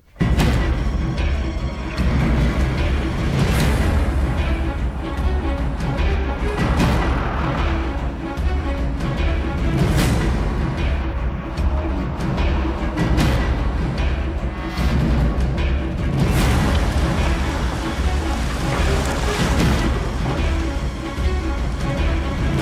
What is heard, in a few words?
Chasing Music